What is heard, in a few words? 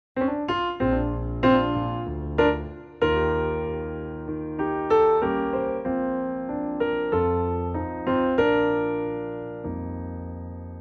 piano; Pianotrak; key Bb - vocal range - C to Eb; This is a gorgeous piano only arrangement of this festive favourite!